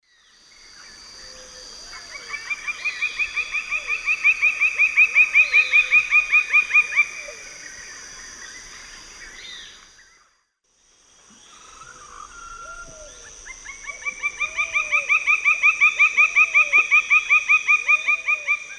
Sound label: Dryocopus lineatus, call; S. America; Eastern Lowlands